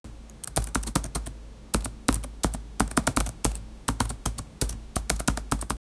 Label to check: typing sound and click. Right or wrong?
Right